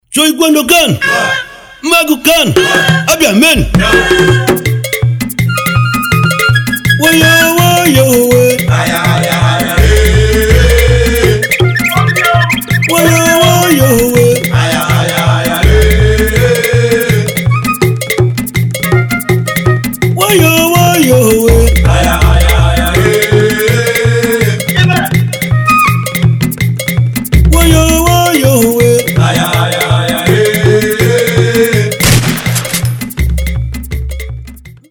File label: Igbo War Dance